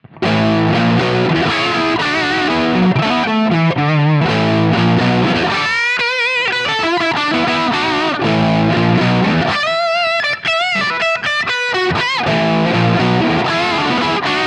plexi drive on

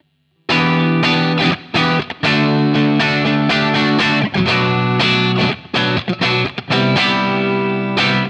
plexi crunch on

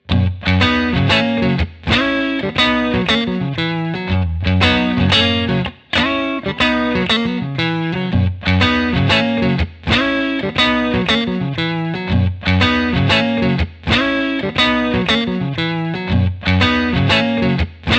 tweed crunch bypass

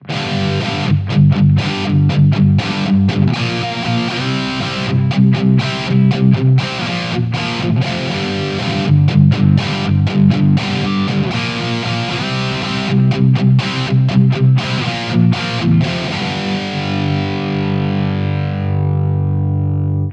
5150 drive bypass

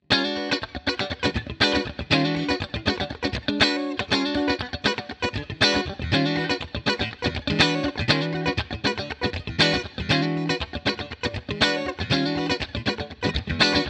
twin clean bypass

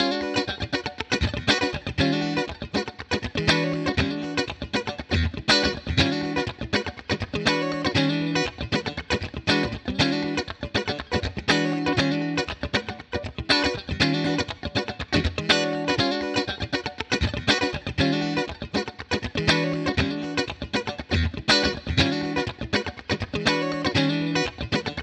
twin clean on

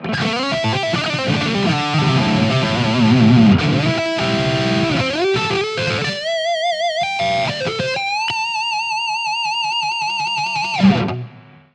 5150 lead bypass

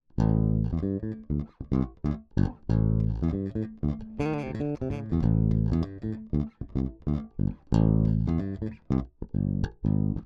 bass finger bypass

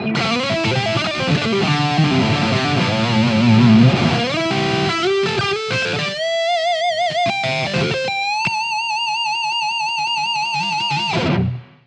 5150 lead on

